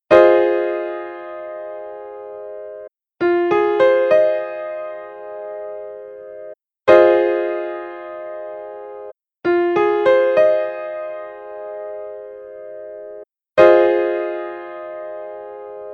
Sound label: Fm7 Piano Chord